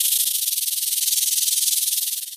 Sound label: toy; Golden Crayon Sounds